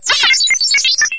hurt; r2 d2; lego star wars

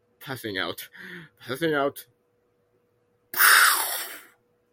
passing out

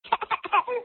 cryinggg

